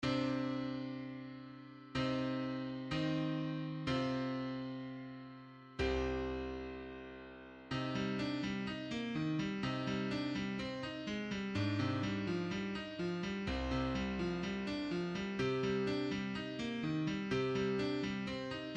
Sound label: piano